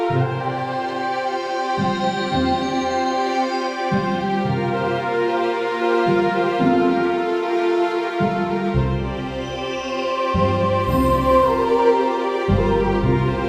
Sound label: Opening Prelude Loop; Toren